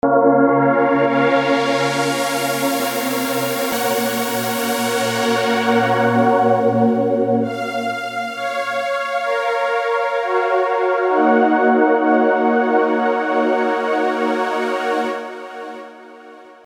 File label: Unison